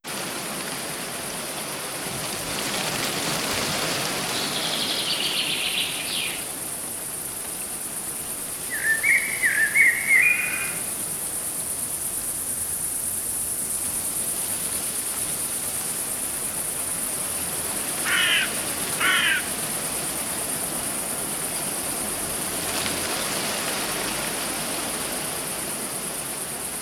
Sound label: Forest Sound Effect; Circle Empires Rivals